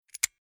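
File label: Tools Monkey Grips Metal Movement Clicks; Hand Tool Sound Effects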